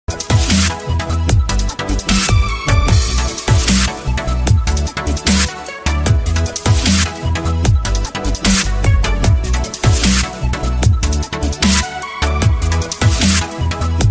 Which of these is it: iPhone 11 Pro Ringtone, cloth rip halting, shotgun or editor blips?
iPhone 11 Pro Ringtone